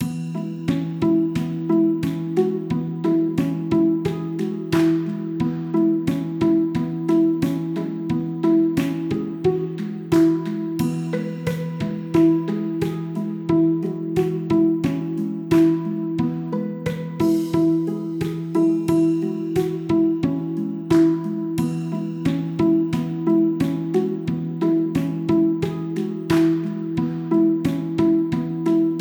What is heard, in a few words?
Chill Game Loop (Menu); Tales of the Tiny Planet